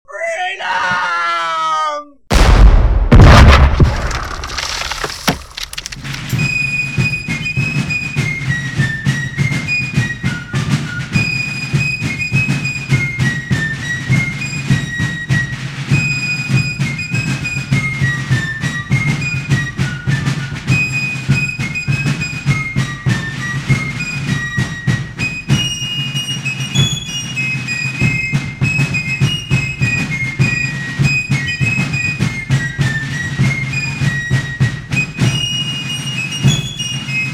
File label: game startup; forlorn